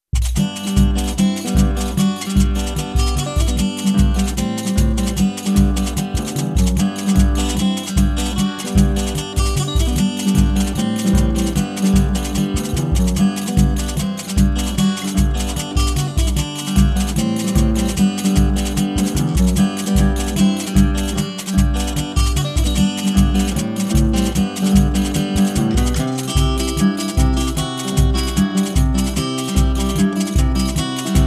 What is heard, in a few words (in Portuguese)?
galopando pro samba